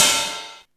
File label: DIRTY MUTE, CYMBALS; CYMBALS 2